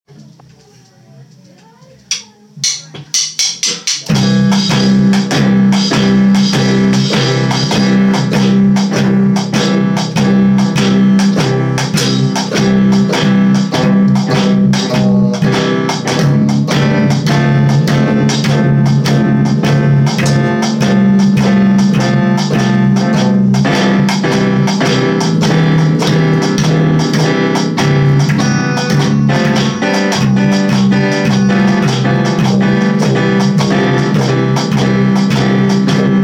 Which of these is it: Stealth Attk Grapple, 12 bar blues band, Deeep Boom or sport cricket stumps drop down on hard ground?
12 bar blues band